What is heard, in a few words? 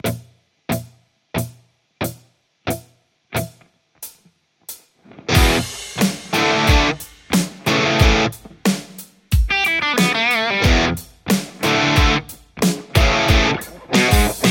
tele tomato; mercuriall